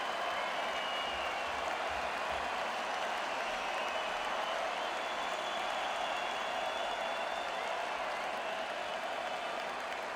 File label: Crowd; Albany, NY - Times Union Center